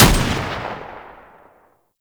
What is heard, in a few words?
Shield Lancer Tutorial Pistol Fire; Warframe; Awakening Remaster